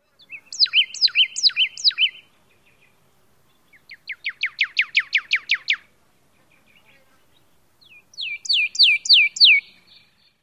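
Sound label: pyrrhuloxia songs; AZ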